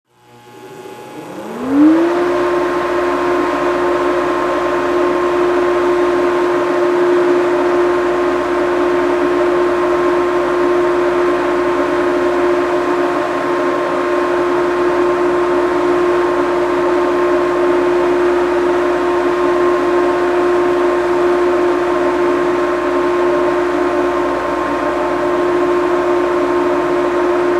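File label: False Fan Startup Slow, industrial fan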